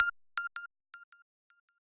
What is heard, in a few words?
lead